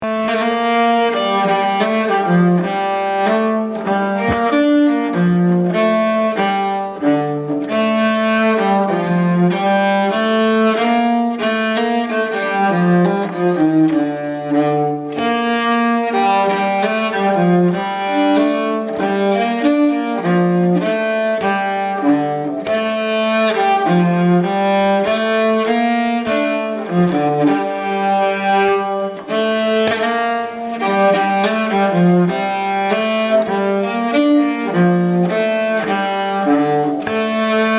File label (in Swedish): Falu Polska Lent, nyckelharpa